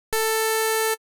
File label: Wavetable Saw PHASE DISTORTION; Skew; Pigments